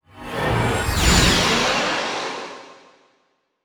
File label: hit